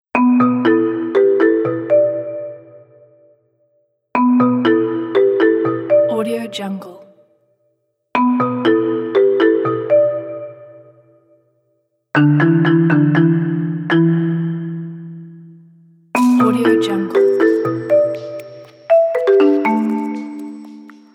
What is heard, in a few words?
documentary nature time